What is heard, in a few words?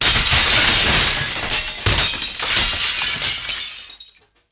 Bowling